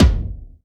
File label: wet kick drum single hit g key; kick drum samples